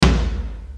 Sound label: floor tom drum